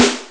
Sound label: desert snare; Amiga Soundtracker Sample Packs